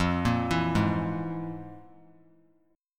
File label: F Minor Major 7th Double Flat 5th; FmM7bb5 arpeggiated; JGuitar